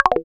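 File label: PER PROCU, Procussion, percussion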